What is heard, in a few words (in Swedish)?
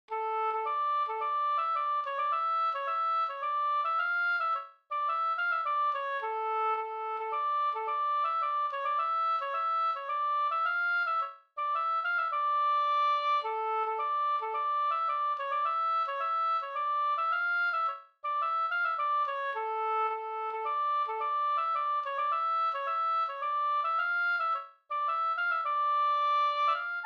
polska Senpolska